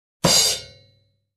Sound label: Kick and Crash Hit drum ending